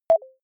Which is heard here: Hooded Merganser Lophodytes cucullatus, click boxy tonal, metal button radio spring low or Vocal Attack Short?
click boxy tonal